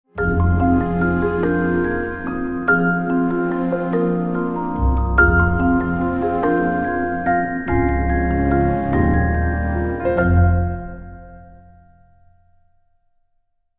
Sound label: Long Sting